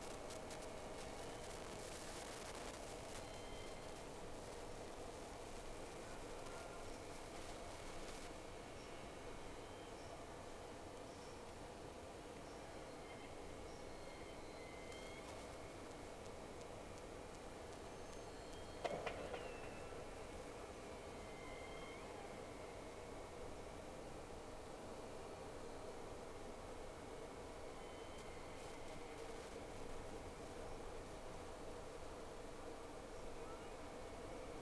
Whales; SeaSnake

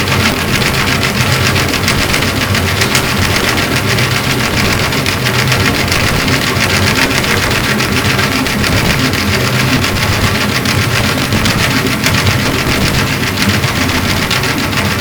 Hydrophones, orcasound

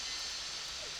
Background Noise

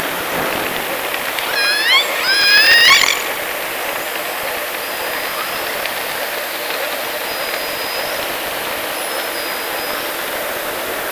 Orca sound Night Passby

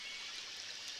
Background Noise Clips

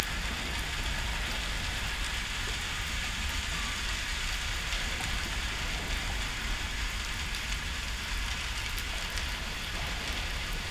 hydrophones, lime kiln

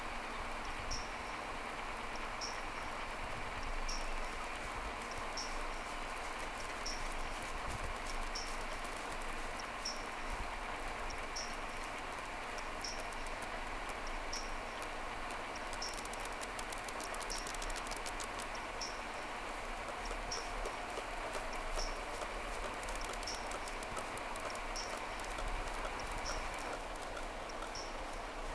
Whales; SeaSnake

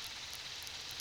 Background Noise

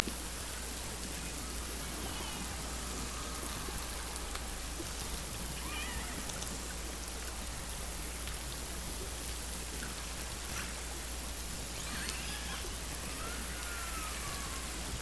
Hydrophones, orcasound

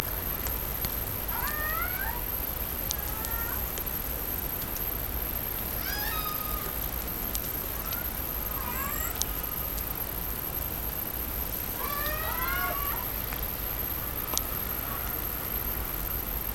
Hydrophones, orcasound

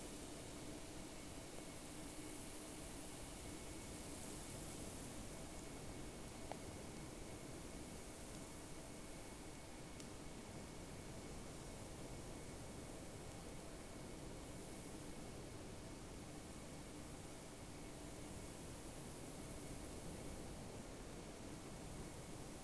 sound, Boat; SeaSnake

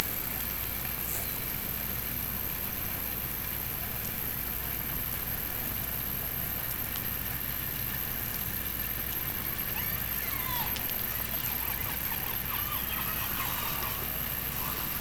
Hydrophones, orcasound

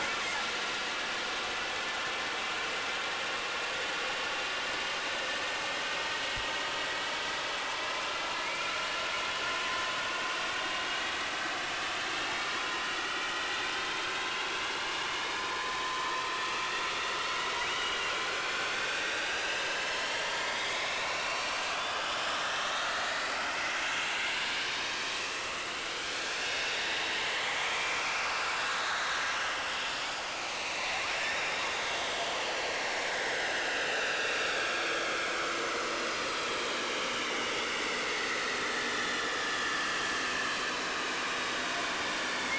Whales; SeaSnake

